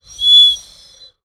Bird whistle, clay, painted, dry, airy, wispy, swell, smooth, strong; Eiravaein Works; Vaeyan IV